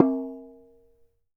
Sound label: CLAY, CAMEROON; AKAI S6000 CD-ROM - WorldSounds; CAMEROON PRC